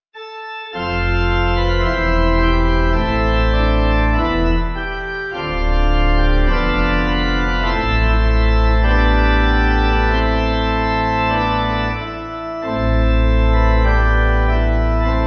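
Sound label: Pipe, Pipe Organ; In Bethlehem A Newborn Boy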